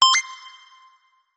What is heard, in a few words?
Snes coins; gridtracker